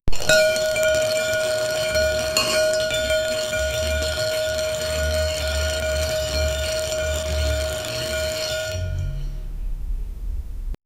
the sound of leather like, fabric

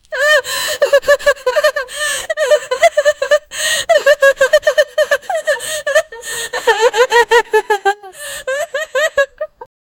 womans crying; emotion

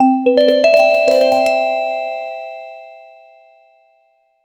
a cheerful inviting ch, chimes

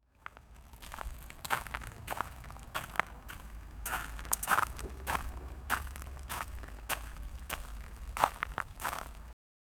walking on gra; minecraftt

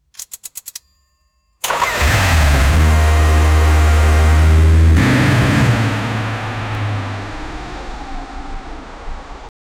super bike ignition start; slowdown

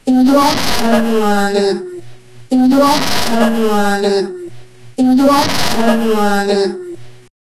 distortion

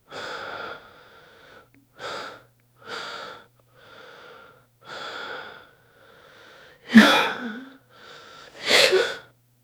realistic panic breathing

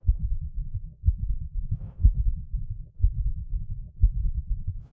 heartbeat pounding heavy; humans

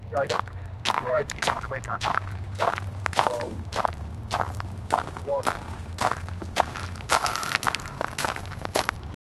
heavy footsteps, army

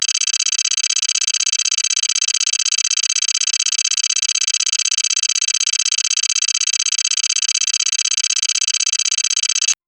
fast text changing sfx, digital

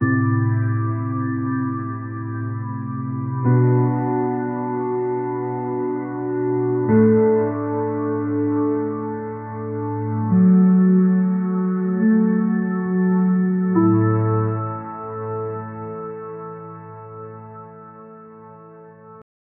ambient background music, 70 bpm

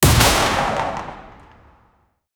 shotgun shot for 32 bit

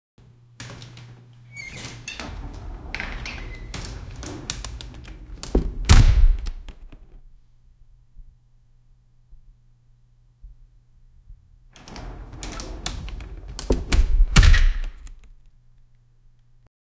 the sound of a door, door opening

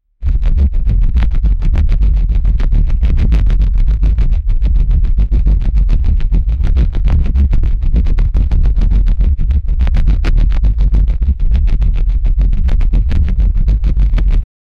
sci-fi reese bass

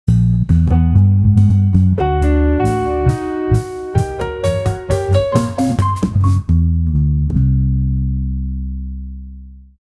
jazz transition

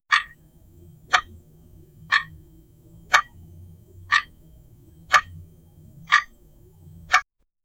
clock ticking slowly ligh; time

